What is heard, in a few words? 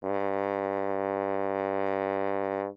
Horn ff G2 stereo; Brass; MIS Pitches - 2014